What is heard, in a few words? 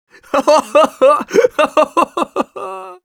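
laugh happy, Russian; Scout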